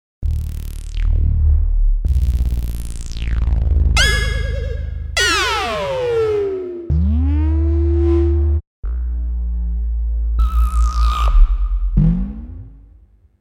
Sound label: Effects Synth Analog